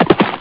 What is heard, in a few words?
horses; ST-XX Amiga Soundtracker Sample Packs